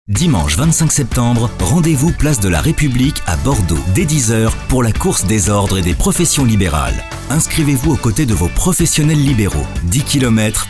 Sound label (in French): musique